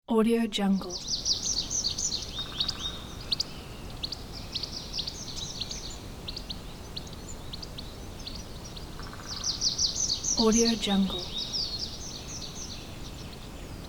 forest ambience

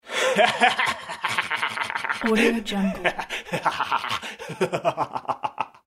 male hysterical laughter

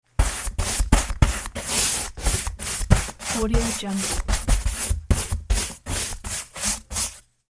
paint brushing

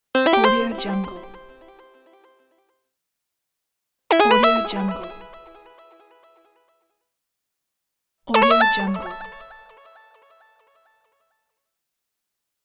game sound pack

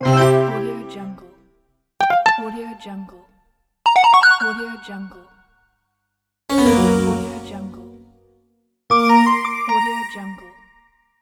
ui phone software pack

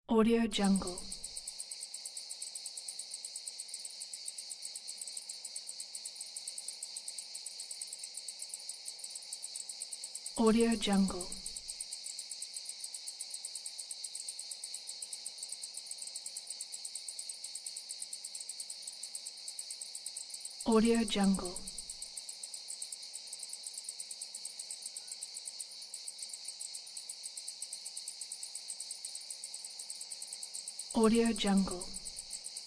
cicadas and crickets

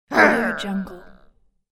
female quick whirr

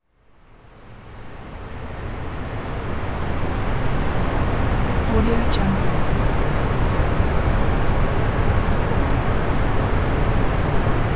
ambient noise

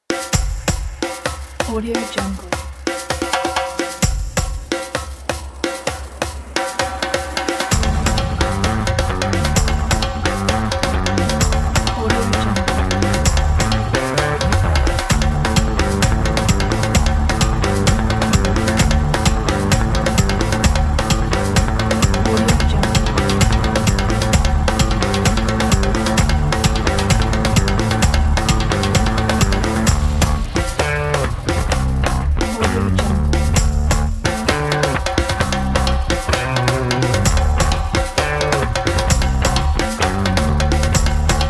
energetic percussion bass

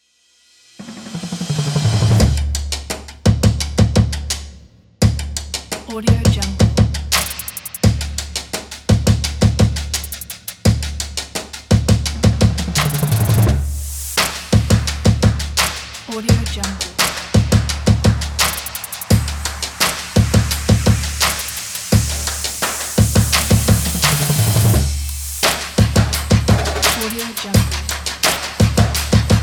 hiphop percussion beat

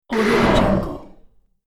whoosh motion sf gate door

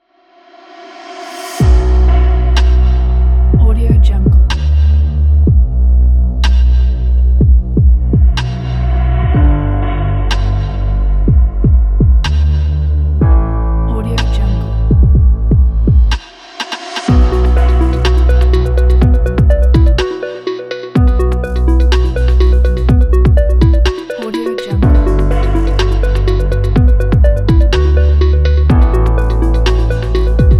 industrial background

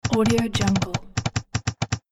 short input data sequence

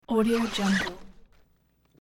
nylon tent door zip up; hollywoodedge

